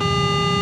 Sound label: Hud warning